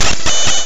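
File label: CYMBAL 90 BPM, rhythm loops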